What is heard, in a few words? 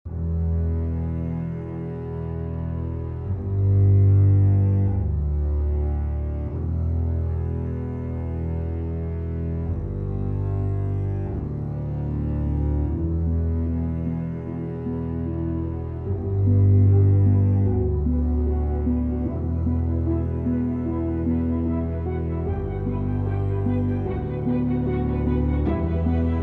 Wet Pavement Orch